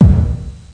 Bass Drum deep; drums

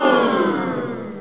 Oooh!; Funhouse of Love

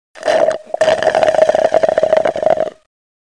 sipping

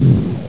bump; hurdles

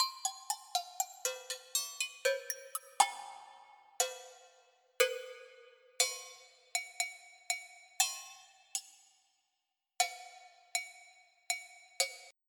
Latin Bells

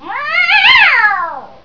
Mad Kitty; Voices From The Net 1.1

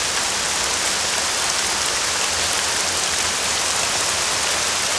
ran loop; DAoC

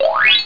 sweep

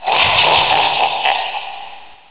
death laugh; Hexen II

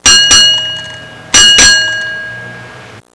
gas station bell; Sound Effects for Multimedia - Volume 1

